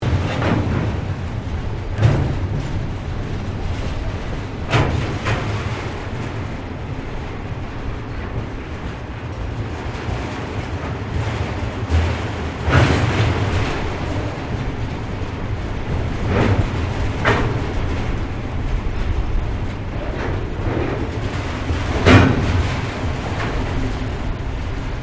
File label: Train Freight Bridge Crossing Truck Noise; Various Melbourne Trains; Vehicles; Vicrail